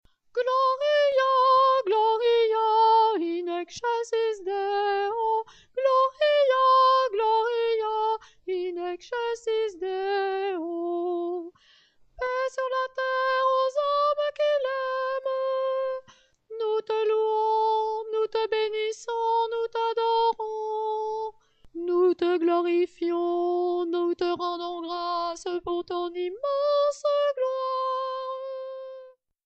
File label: Soprano, Chorale